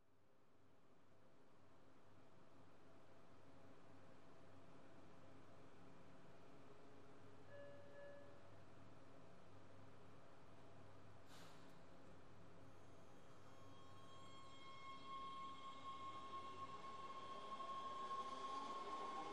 Subway Train Hum Beep, subway

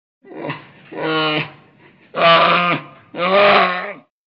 Bear Unknown Type

